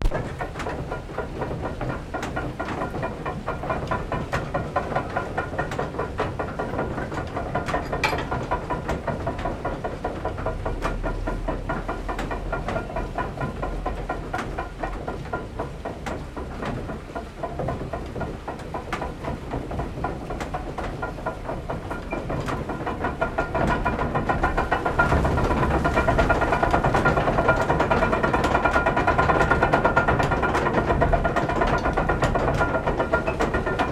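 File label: Main beam turned by water wheel, mill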